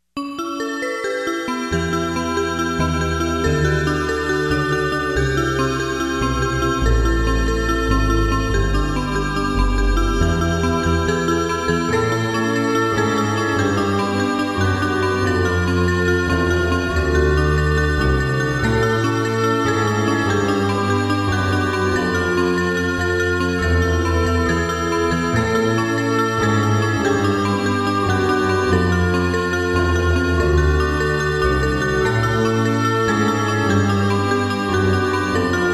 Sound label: Instrumental